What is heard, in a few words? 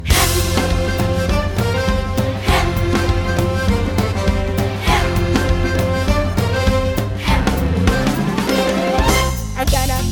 Audition Vocal Track; audition materials; Disney's the Lion King Kids